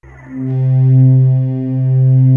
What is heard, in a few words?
PASSION C3; Greytsounds; Scott Peers Sound Engineering